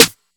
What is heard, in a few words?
Snares